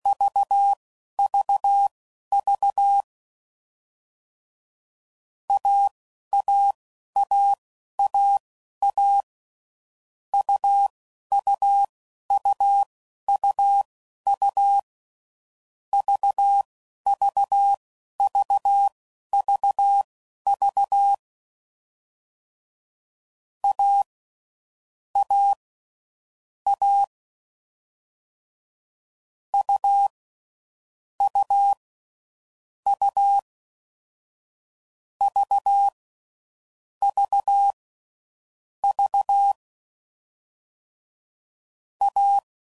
Difficult Combos, CW Code Groups 10WPM; Morse Code; Mixed; Combinations

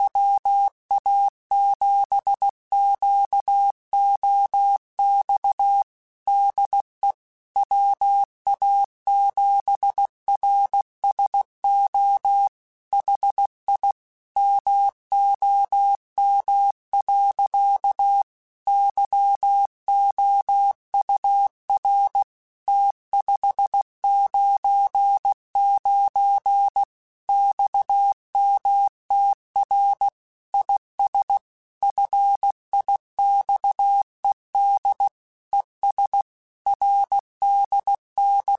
Morse Code, QSOs

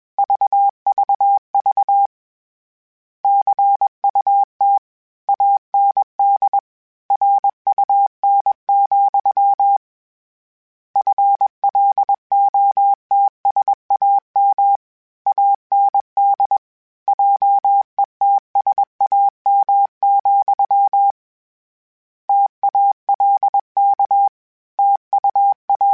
Word Pairs, Morse Code; Mixed; HamRadio

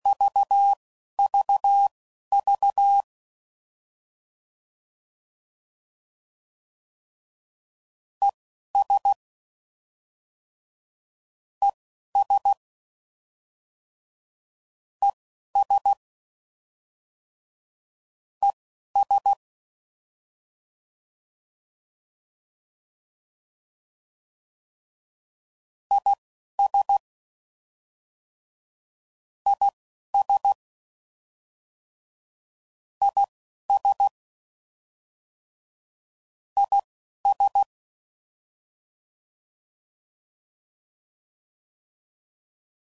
Short Contrasts, CW Code Groups 10WPM; Morse Code; Short Runs

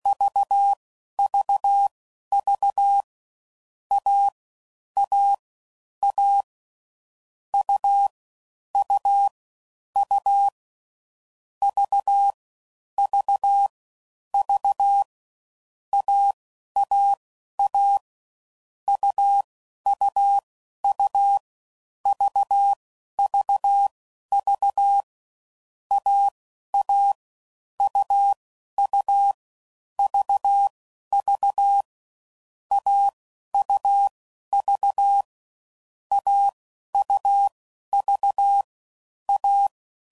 CW Code Groups 5WPM, Difficult Combos; Mixed; Combinations

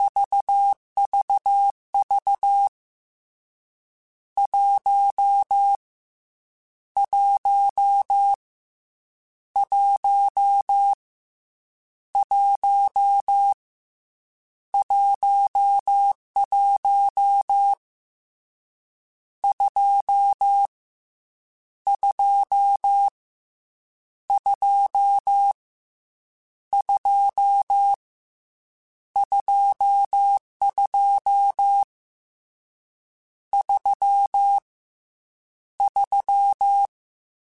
Morse Code, CW Code Groups; HamRadio; Mixed

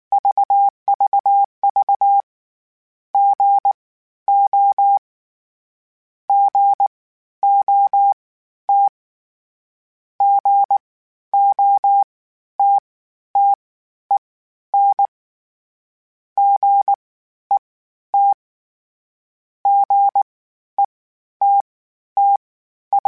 Morse Code, CW Code Groups; Mixed

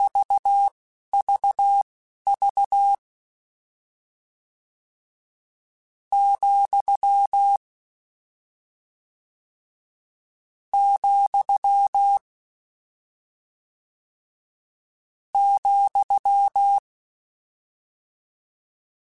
Morse Code, CW Code Groups; Mixed

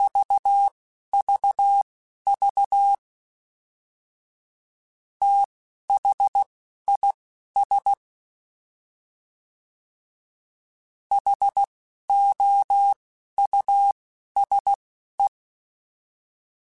T then H Runs, Morse Code; CW Code Groups; Mixed; Single T or H; HamRadio; Prefixes

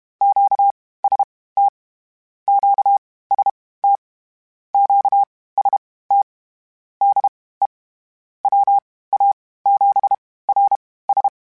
QST QST, Morse Code; HamRadio

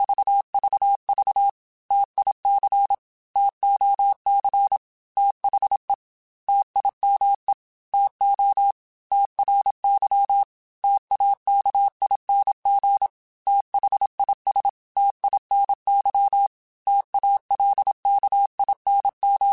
T Runs, Morse Code; CW Code Groups; Mixed; Single T or H; HamRadio; Prefixes

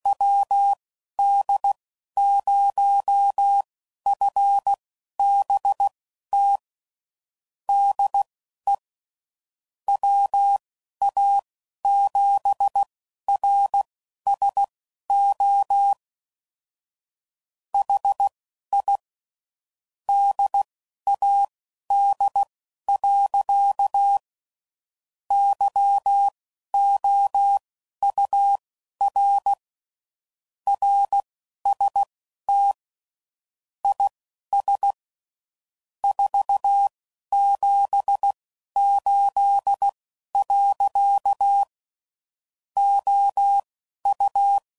QSO, CW Code Groups 10WPM; HamRadio; Morse Code; WD0FBT

